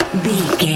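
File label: printer scanner labeler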